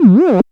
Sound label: bass F#, Talking Bass, Dance Synths